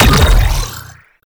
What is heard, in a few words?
Octopede Doom Pulse; Warframe; ManInTheWall